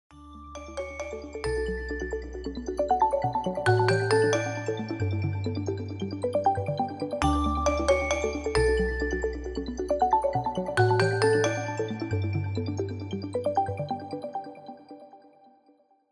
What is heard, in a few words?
aegean alarm ringtone